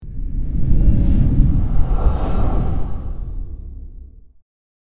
Squadron of spacecraft jets fly by

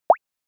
Bubble click

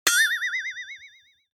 Cartoon accent funny impact

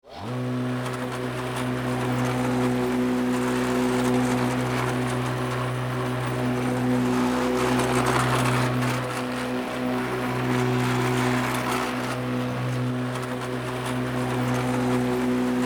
Electric lawn mower garden mowing the lawn

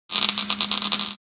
Electric static spark

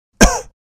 Middle aged man cough

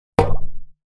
Cartoon accent impact thud

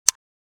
Assault rifle fire selector; gfxsounds

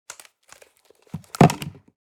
Headphones set down; headphones and speakers

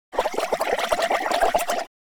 Cartoon air bubble water surface

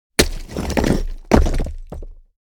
Big stones dropped and dragged on a pile of rocks

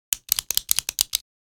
Pen office clicking multiple times

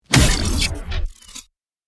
Robot punch impact; robotic; gfxsounds

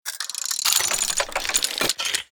Small gears mechanism movement

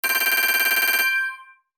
Digital interface scrolling menu ui

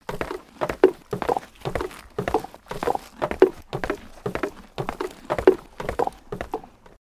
Clacking hooves on hard ground

